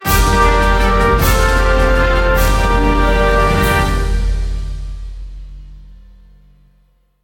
Big Orchestral Lose Fanfare

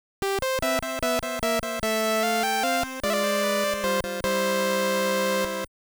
Quiet Sleep; Estpolis Biography

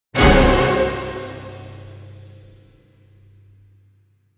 Orchestral Hit

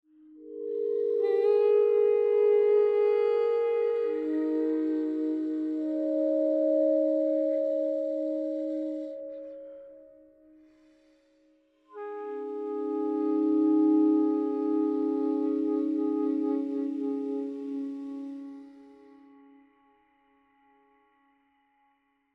Pads and Toy Flute

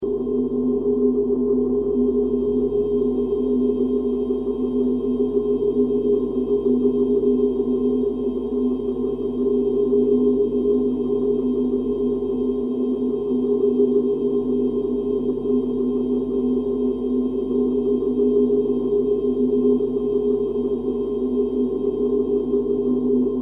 Ruins Light Beam Loop

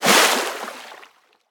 pop out of water; Scrat

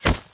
Enemy Boss Shoot Hit; pulling no punches; Smilinguido

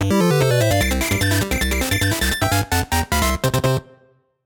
Race Victory; Extremely Lucky

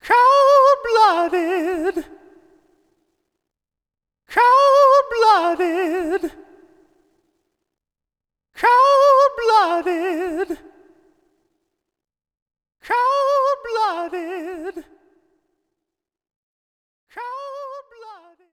swords

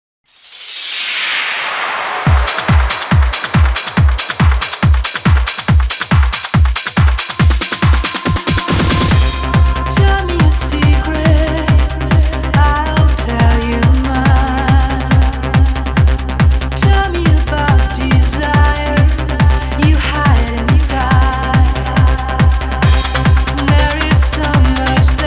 Diving, 4 Strings; Dance Dance Revolution Extreme; PS2

